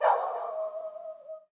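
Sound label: distant dog; deadstrike; ambience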